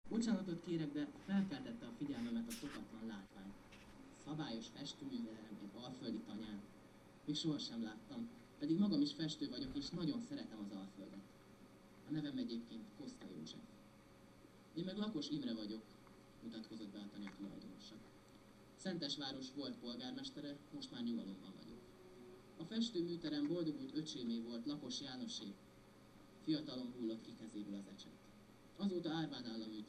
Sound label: digitar